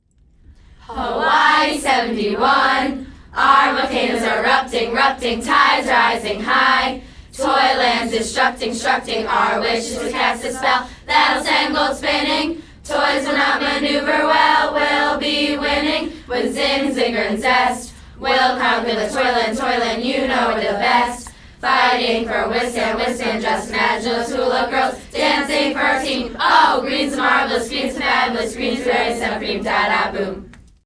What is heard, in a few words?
cheers